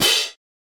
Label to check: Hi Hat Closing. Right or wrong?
Right